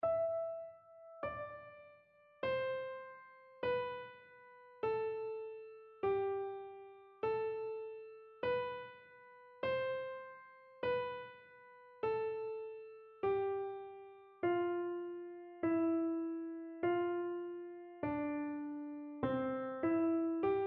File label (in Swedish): Pachelbels kanon i C dur Latt piano